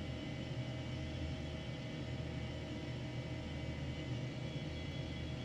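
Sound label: ambient midtone, sewers; garrysmod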